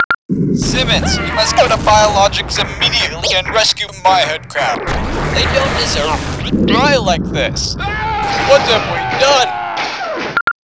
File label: datapad; svencoop; nlab